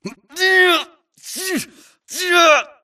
grunt Struggle; Titanfall 2

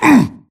grunt hits; Titanfall 2

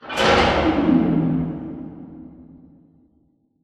Robot Arm Track Stop Dist; Boomtown; Titanfall 2

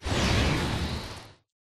atlas dash slide; Titanfall 2

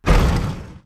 bullet explosive titan shudder; Titanfall 2

